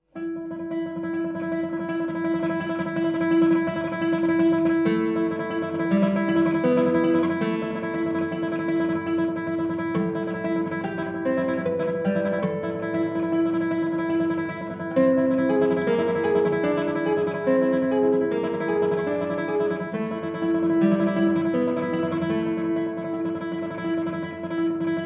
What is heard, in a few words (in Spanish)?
Campanas del Alba